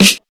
Short Steel Snare Drum Sample G Key, acoustic snare tuned to the G note; snare drum samples